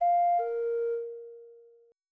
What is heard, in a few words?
seatbelt